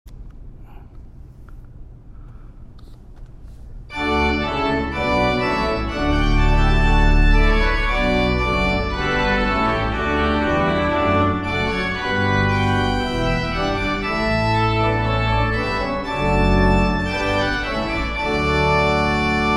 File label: Trumpet Tune in D; David Johnson